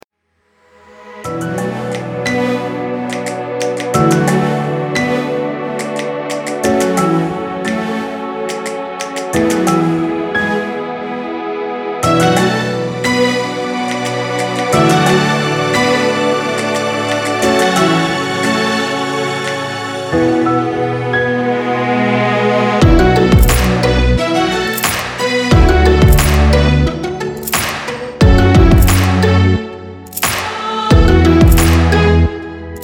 Музыка в стиле R&B

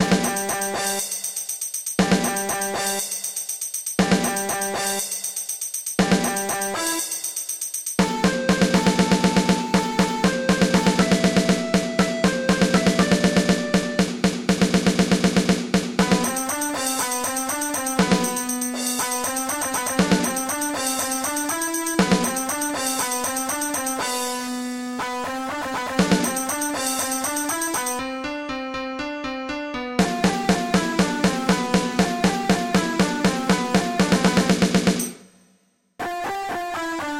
Турецкая мелодия

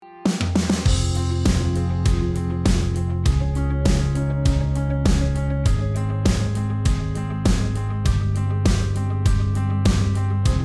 Музыка в стиле Pop-rock

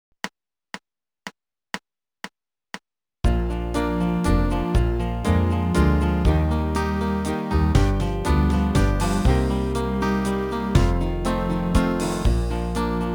Тихая ночь над землёю (христианская песня) - минус

